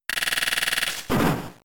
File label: Secret Power; Pokemon; Gen 3; attack moves; RSE